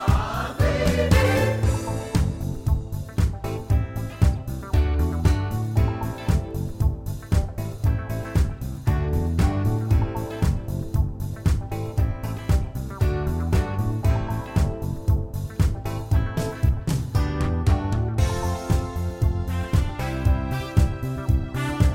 Karaoke Disco; the sims 4 city living

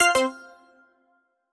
Jingle Lap; Clubhouse Games: 51 Worldwide Classics

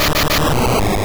Egg Bomb; sfx; attack moves; pokemon; gen 2; gsc

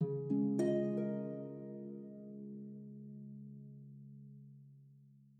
Calm Harp; super mario maker 2; Peaceful SFX Raws - Otoasobi

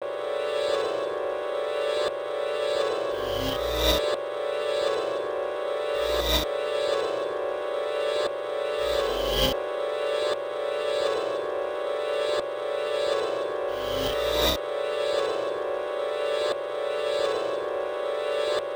Meditation (Image Screen); Samurai Shodown; Samurai Spirits 4; Amakusa's Revenge; Neo Geo; arcade

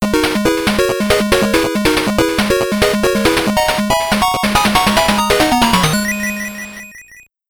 Round Clear; GG Aleste; game gear

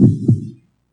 Heartbeat Loop; don t be afraid the first toy

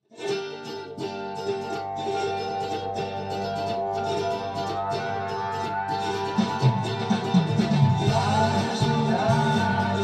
Georgian Music; Sniper Ghost Warrior 3 2017

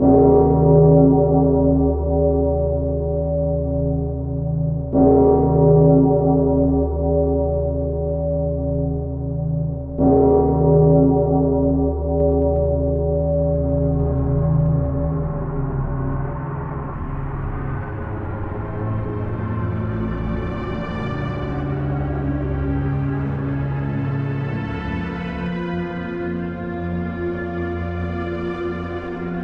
Bell

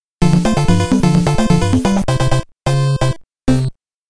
Samba; Ganbare Goemon Sound Treasure Box

